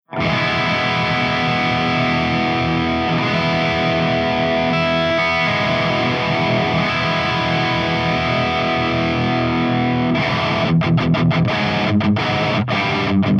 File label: EVH5150 CH3 DRIVE V30 P90